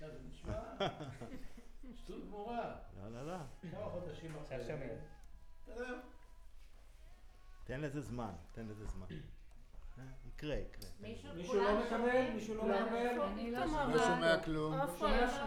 Center u47, Choir

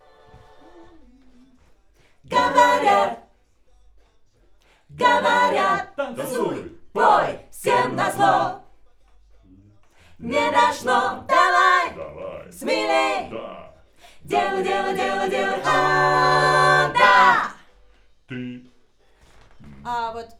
Schoeps AKG, piano

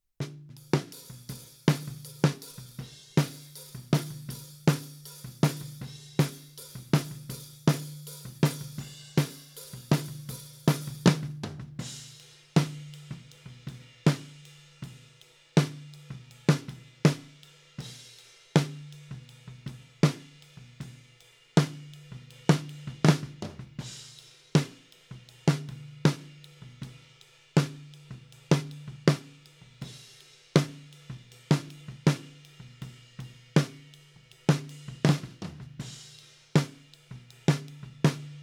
Snare Top, 160 BPM

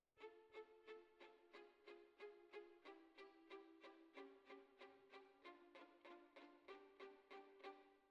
Violins Over

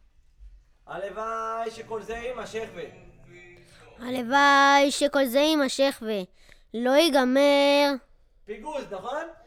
VOC

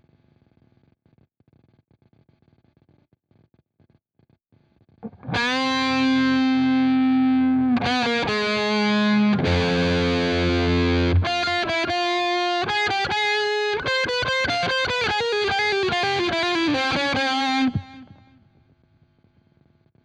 Guitar

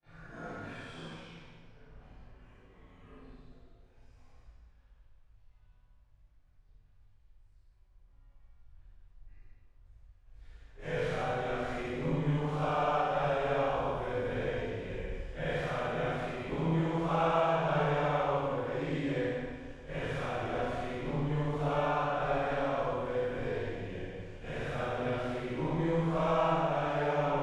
Choir

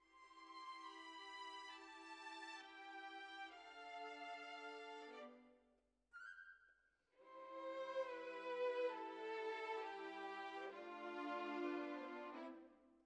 Violins, jazz for piano

